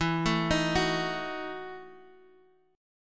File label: E Major 7th Suspended 2nd Suspended 4th E, Guitar, Half Step Down